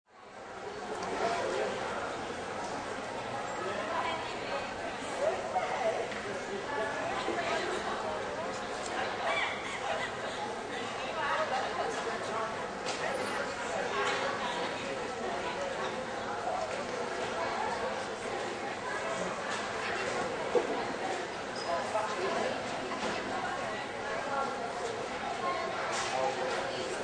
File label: Airport crowd ambience. Cafe nearby. Moderate traffic of people; Airport crowd ambience. Cafe nearby. Moderate traffic of people in arrivals hall.